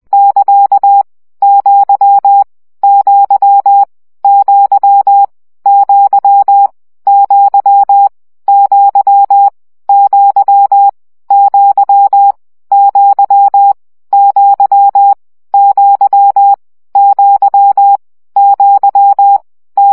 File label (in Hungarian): CW gyakorlas felkialto 17 baud